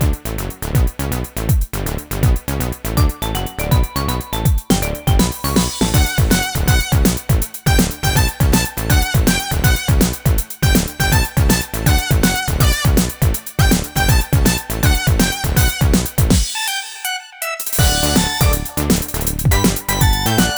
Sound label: Multi Lobby; Dr. Mario World; Android